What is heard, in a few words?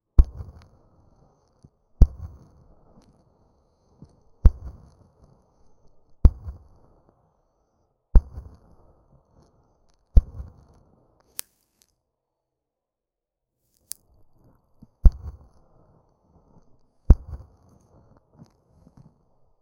heavy breathing showing